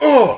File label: Get Hit; Weekend Warrior; Butcher